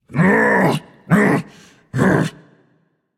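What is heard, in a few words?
non-verbal grumble; smite; atlas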